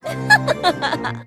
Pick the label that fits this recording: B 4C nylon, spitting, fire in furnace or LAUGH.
LAUGH